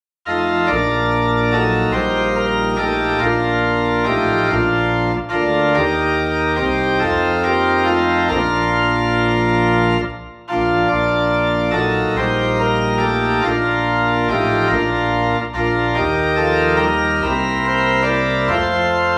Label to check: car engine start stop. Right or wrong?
Wrong